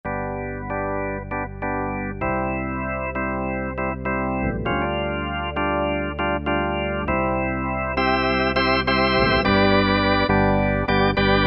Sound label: Korg MSC-9S Organ